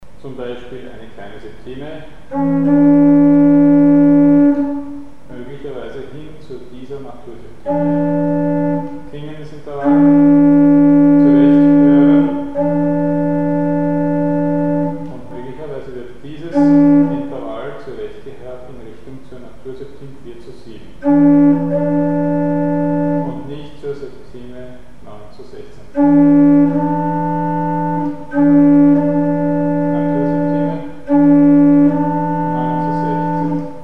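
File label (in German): Testbeispiel Zurechthören einer verstimmten Kleinen Septime; Mikrobuch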